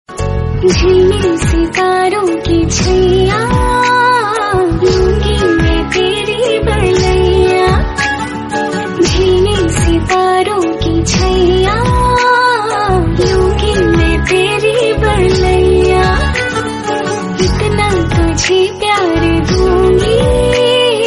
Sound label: Hindi Love Ringtone